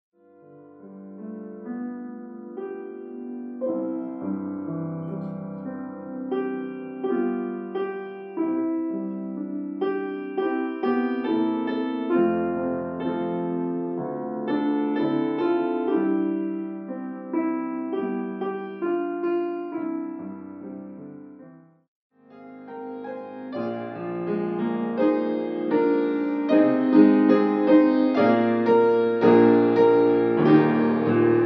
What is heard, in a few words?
How Great Thou Art Piano